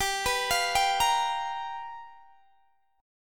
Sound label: G 7th Sharp 9th G, Guitar Standard; G7#9 arpeggiated; JGuitar